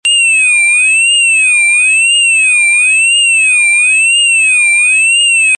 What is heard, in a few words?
tone